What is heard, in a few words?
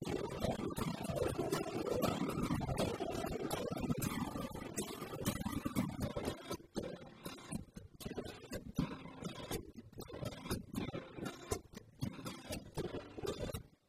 Flange reverb